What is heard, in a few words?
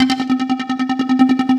LOOP FX, Drum & Bass, 150 BPM; Ueberschall; Drum & Bass Resonance